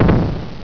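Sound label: explode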